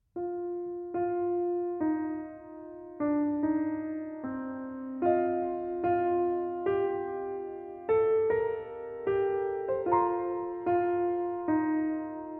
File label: Improvisations on Hungarian Peasant Songs molto moderato